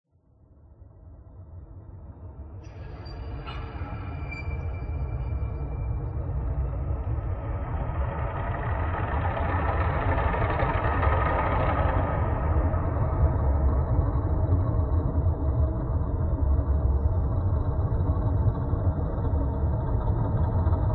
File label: Gritting Sample